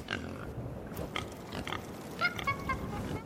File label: multiple animal grunts